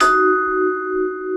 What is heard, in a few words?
VIBES; Houseworx